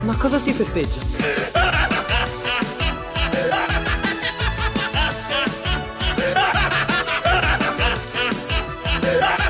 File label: haahhahaha